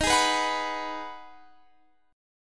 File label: Ab Minor 7th